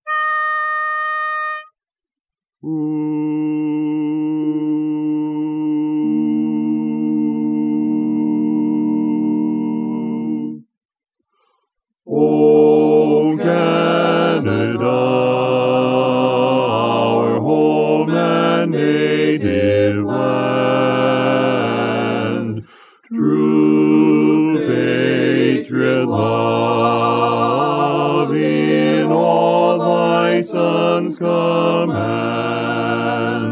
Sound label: O Canada bass; Barberpole Cat I Learning Tracks